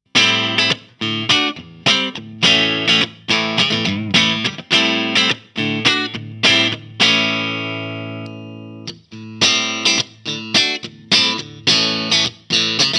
JAZZMASTER Clean; Fender AV II 66

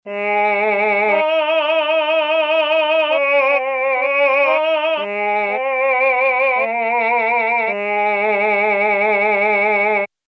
Mellotron M4000D Male Voice; MusicMaster

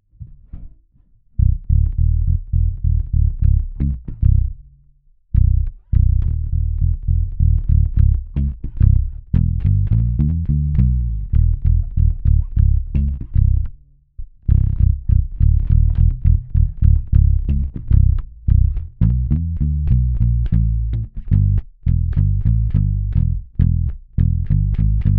SRX756 lowend; IBZ

